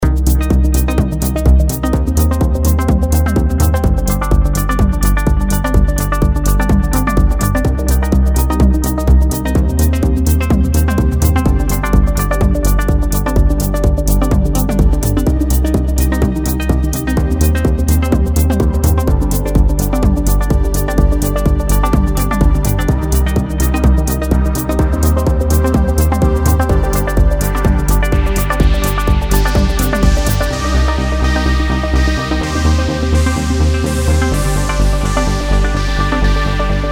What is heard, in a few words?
Sylenth1